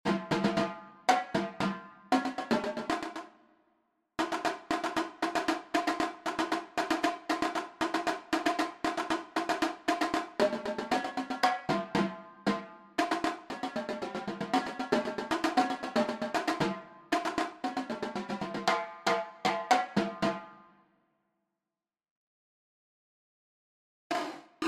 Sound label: cadence tenors, drumline